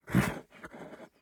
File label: horse grunt